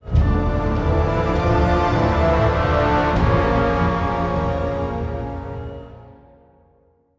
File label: Play Stinger; Goru